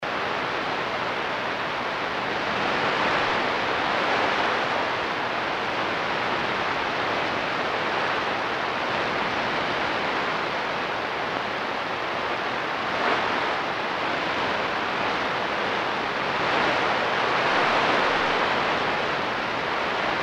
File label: Sun Type III; Ashcraft